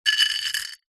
household necklace beaded set down in ceramic bowl or surface